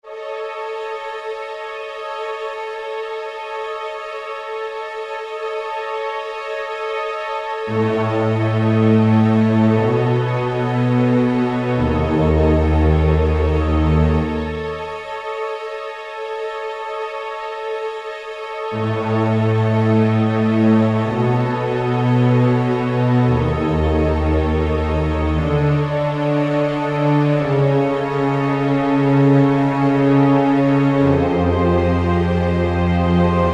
Full Orchestra, Roland SR-JV80-02 Orchestral

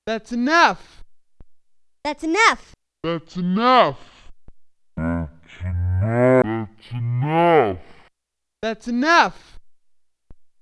Big Band Performance; That,s enuff; Yamaha TX16W Sound Library

